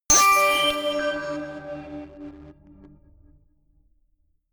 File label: notification glockenspiel crazy fifth